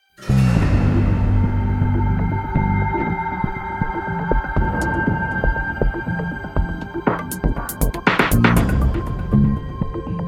Explore Gm 120bpm; Disney Infinity 2.0 Marvel Super Heroes; Arachna

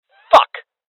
Sniper Miss; Rebel Cops

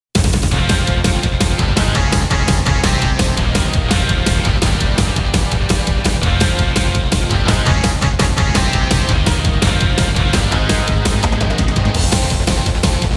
Headbanging Metal; Gyakuten Saiban 6

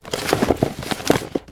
paper Death; god awe full clicker 2018 gamerip

